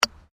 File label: Digging Small Handheld Tool Rocks